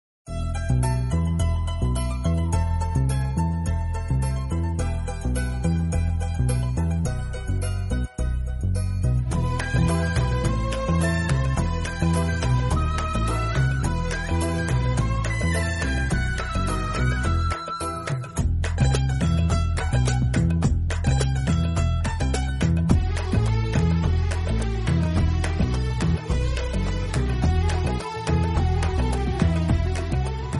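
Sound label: Love background music, Telugu Ringtone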